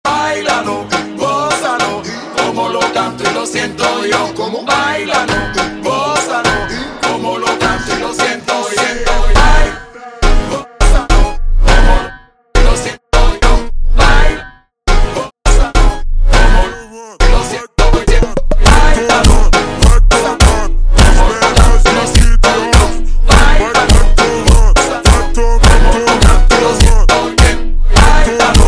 Funk super Slowed

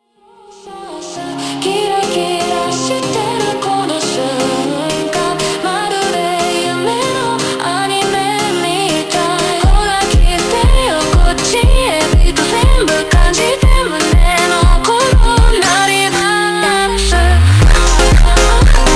Montagem slowed

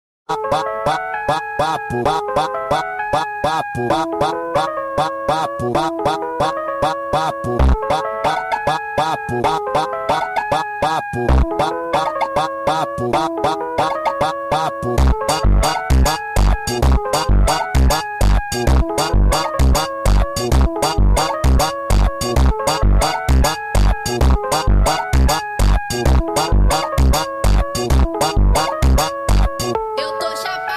Liminal Funk, Slowed

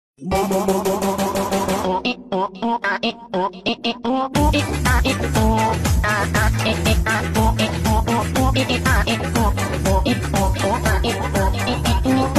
bandari

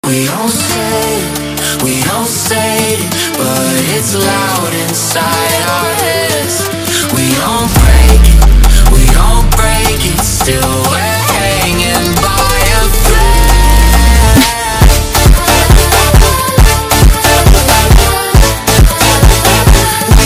FUNK

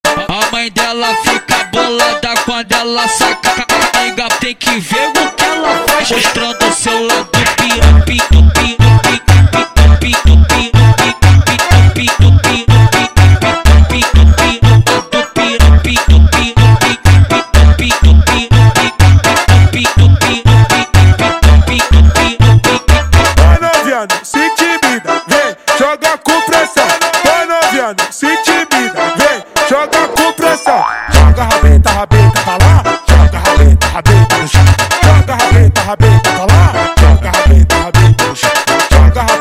Digital Funk slowed

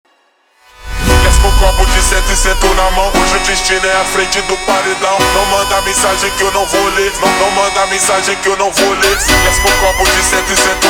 Montagem slowed

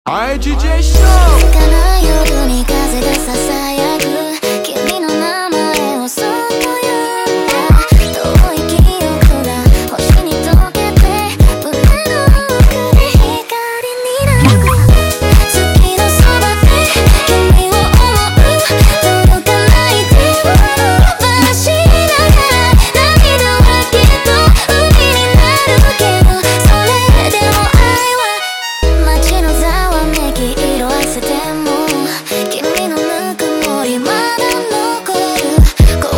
Montagem sped Up

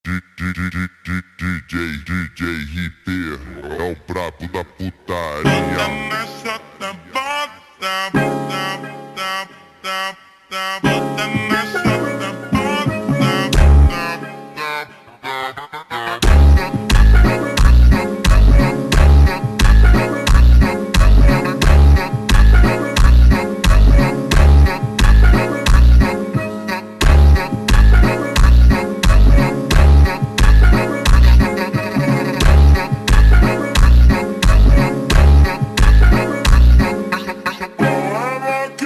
Montagem ultra Slowed

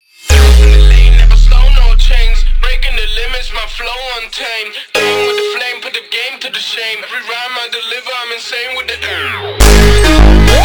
Montagem slowed

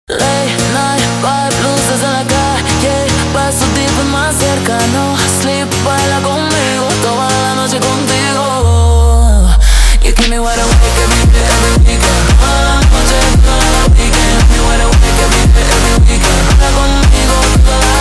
Montagem slowed

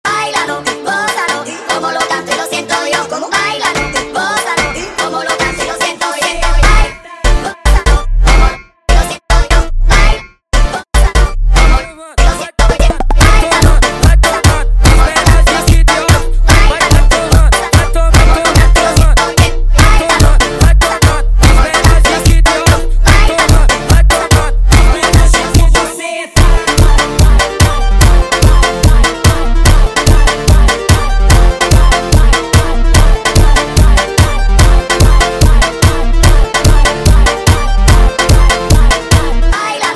Funk sped Up

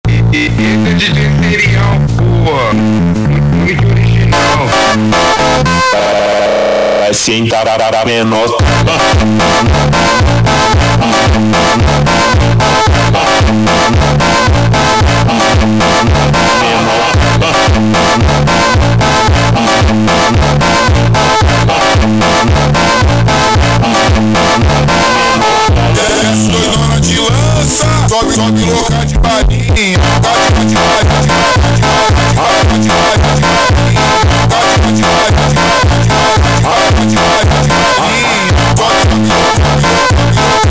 Montagem slowed

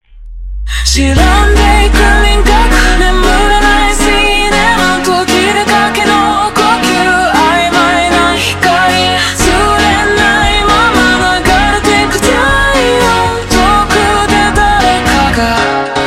Montagem super Slowed

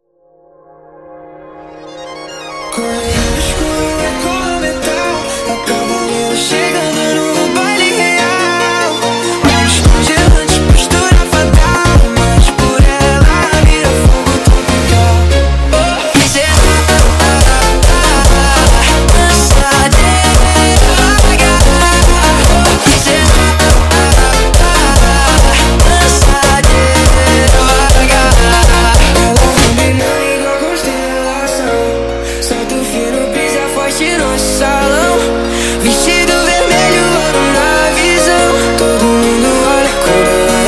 Montagem sped Up

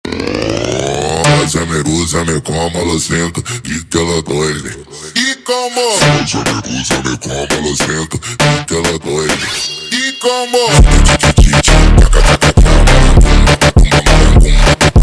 Montagem super Slowed

